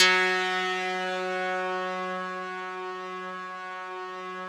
RESMET2 F#3, Yamaha VP-1, RESO METAL 2; Music Store; 90's Sample CDs

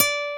NYLON D 5, Bass Guitar; AKAI S6000 CD-ROM - Volume 1; NYLON GT

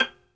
Synclavier Strings, SOLO VI; ILIO

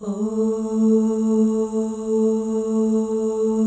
OH, FEM, Symphony of Voices; Spectrasonics

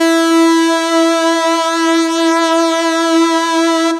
Lead Synth (single note)

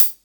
HH CL, Drum 1 Dry; East Collexion

